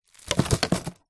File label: food sweets candy handful boiled wrapped put back into plastic tub